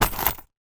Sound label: foley small backpack containing coins place down